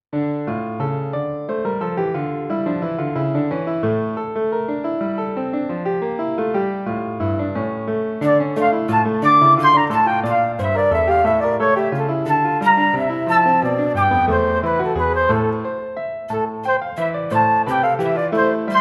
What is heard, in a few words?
Flute